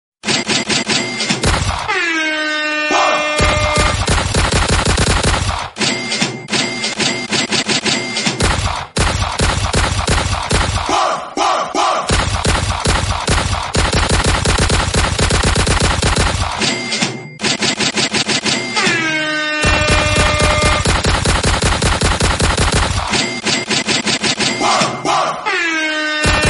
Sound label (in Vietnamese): nhac chuong ban sung vui nhon